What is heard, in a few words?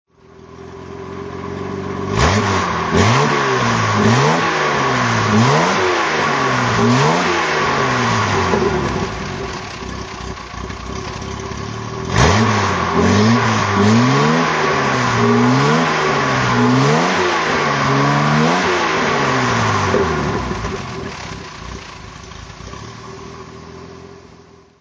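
etype 42 fhc throttle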